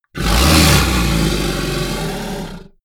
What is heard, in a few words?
beast roar large short; kreaturen; comedy-cartoon; soundpool